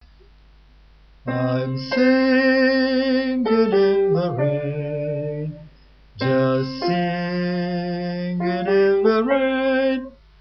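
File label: soprano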